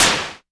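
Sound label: snare, Moog Minimoog Drums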